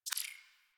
UI Default Theme Drag Start; Warframe

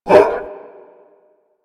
Infested Melee Grunt; Warframe

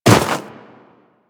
Run Snow, Footsteps; DE; Warframe; Tenno

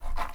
Wood Stool Drag Scrape

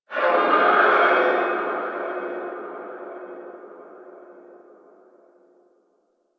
Stadium Infested Far; Ambience; Warframe; NineteenNinetyNine

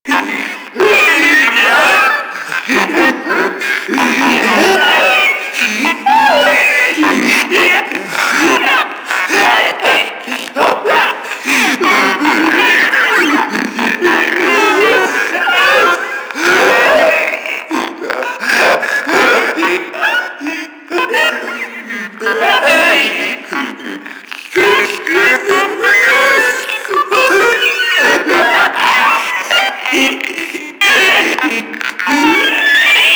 Breathing Loop; Enemies; Warframe; Infested; InfestedNinetyNine

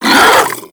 Infested Charger Pain; Warframe

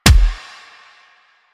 Gunbrella Punch, Weapons; Warframe; Tenno; Koumei

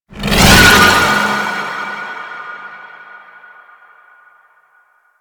Infested Juggernaut Charge Burst; Warframe; Attacks

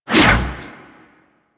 Ball Throw Whoosh; Warframe; Missions; Tenno Ball

